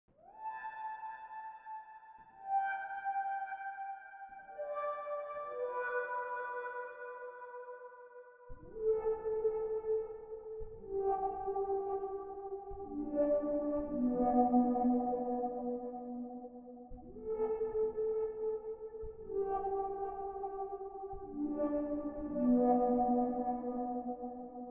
114 BPM E Min Synth; Loop Stems; Cymatics; Obsessions

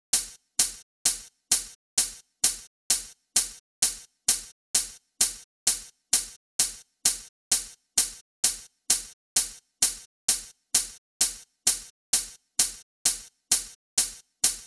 Drum Loop - 130 BPM Open Hat; Loop Stems; Cymatics; DOPE DRUMS VOL. 1; Steam

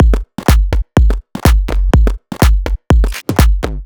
Tribal Drum Loop - 124 BPM; House; Full Drum Loops; Cymatics

